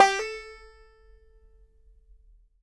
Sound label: BANJO NOTES, A 4; Sampleheads - Whole Lotta Country 1 & 2